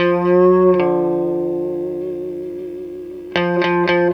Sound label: GTR2 R, Roots Disco; USB Soundscan vol.32; AKAI